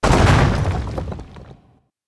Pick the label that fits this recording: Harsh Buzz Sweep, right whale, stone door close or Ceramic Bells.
stone door close